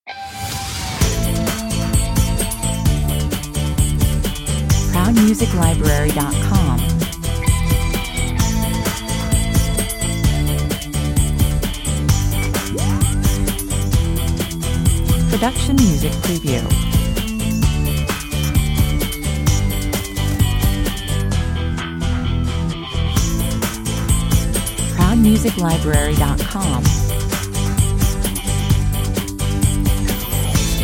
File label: 70s party, 130 bpm, Care-free, easy, upbeat positive rock track, determined positive mood